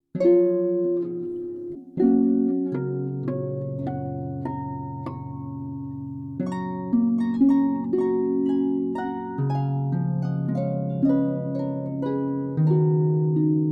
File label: Harp